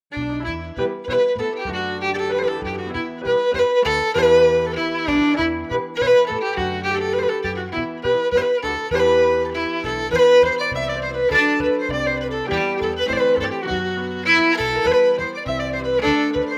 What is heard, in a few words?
Five String Reel, FIDDLE PIANO; Jink & Diddle Book o' Tunes 2022